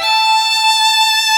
violin; osu

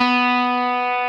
Arp.

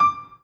Piano Chord

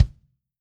kick

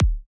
drum hit clap

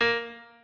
piano

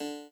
chord

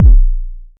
kekk long med bass

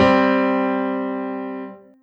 Two G3 C4; osu

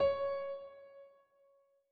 Piano (C#4)

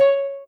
soft hitwhistle; osu

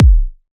quieter kick

